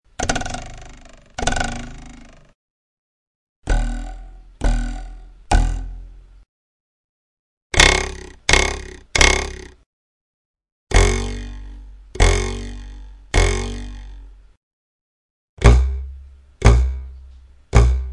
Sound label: vibrating sound of a ruler